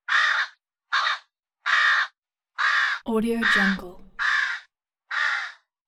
bird crow

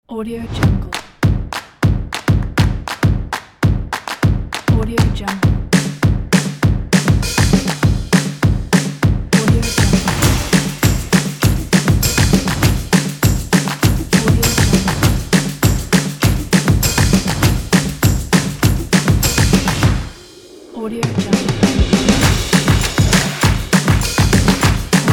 stomp beat

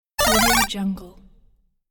game levelup

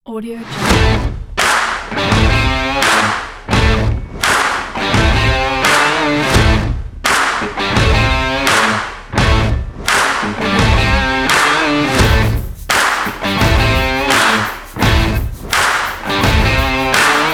sport rock

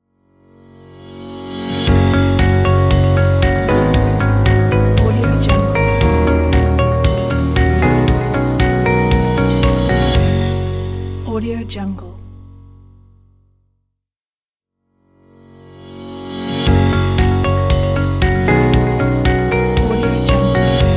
the corporate logo; luca clerici

